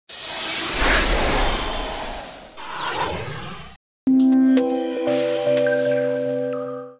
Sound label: Power On; Samsung SGH-i897-Captivate